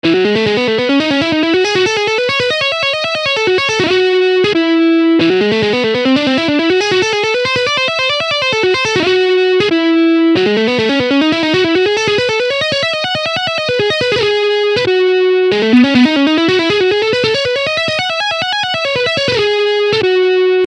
Hybrid Picking Exercise

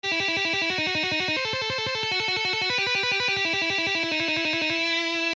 Shredding Exercise

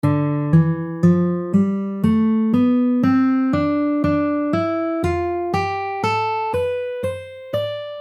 D Dorian Modes, guitar

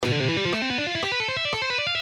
C Major or A Minor, guitar

